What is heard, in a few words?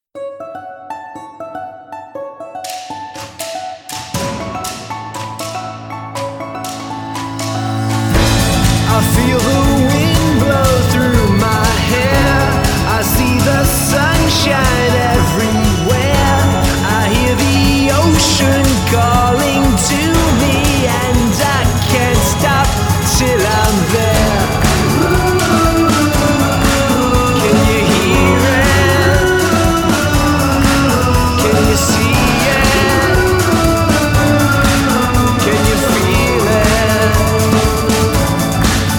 vocal